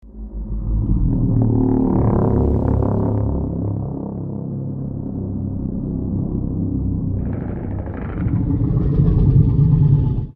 atomic growl; godzilla 2014

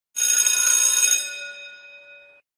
school bell

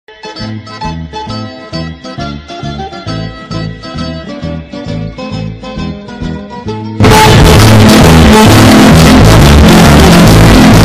tarantella napoletana

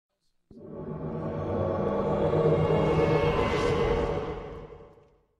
summoning sound; dark souls

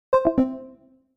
discord vc activity ended

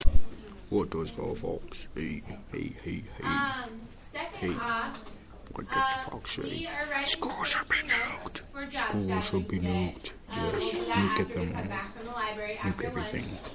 foxes

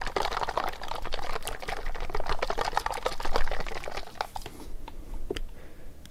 shake

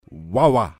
uaua

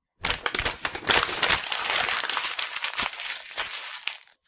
paper crumpling

